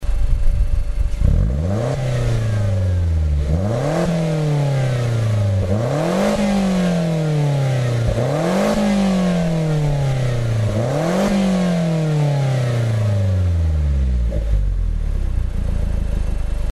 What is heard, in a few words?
Mazda2 Demio DJ, Muffler; AutoExe